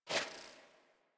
UI Theme Dialog Open; DE; Warframe; Zephyr

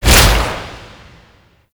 Infested Hard Drive Cudgel, melee attack; Warframe; Infested Lich

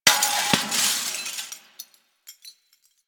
Desktop Glass Destroy; Warframe; Ambience; Entrati

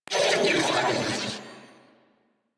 Death, Female; en; Warframe; New War; Narmer Grineer

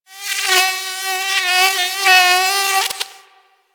Forest Close Insect Buzz Close; Warframe; Grineer Forest

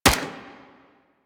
Sundial SMG Shot, weapons; Warframe; Tenno

